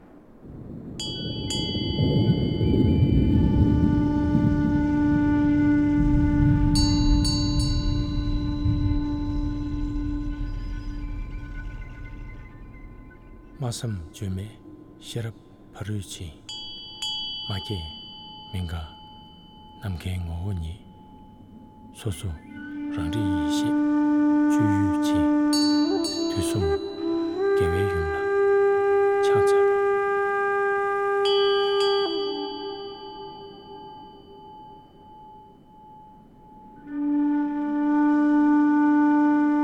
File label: Tibetan Meditation Music